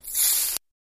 DC Arc Across Knife, arcs & sparks

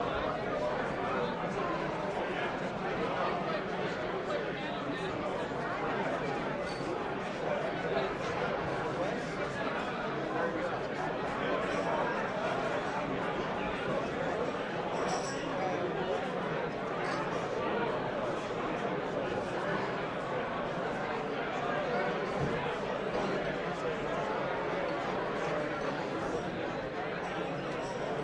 Party Walla Hubbub

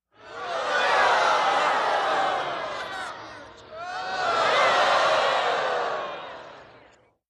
Theater Crowd Big Ooo

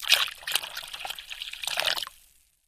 Water Pouring Out AC, splashes

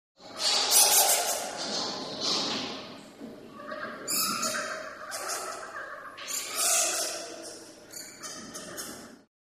Bat Mountain Squeals, bats

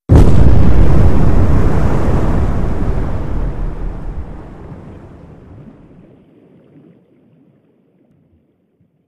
Underwater Explo, explosions

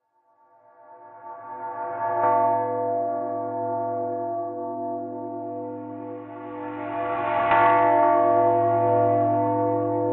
Church Bells Ringing; bell towers

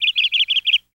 Canary Call, Chirp

